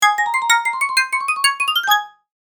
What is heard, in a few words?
Marimba win success level complete mobile game